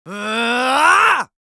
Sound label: Yells, Viking Vocal